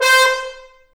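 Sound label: Big Band Fast, trombone; Roland L-CDC-01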